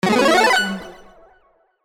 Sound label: arcade full power charge